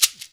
SHEKERE; InVision Interactive; Percussion Slam

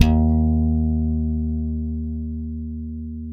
ALEMBIC BASS D#2; Masterbits CLIMAX 7 - Bass

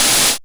REV Brush, Roland JV-2080

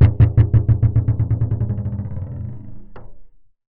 Marbles & Framedrum; contact mic; musicradar; contact mic madness; Raw

